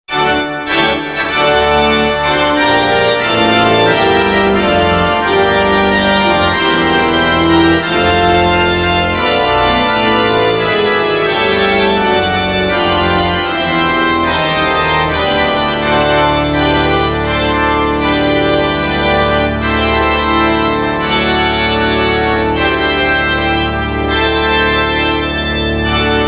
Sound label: Church; wersi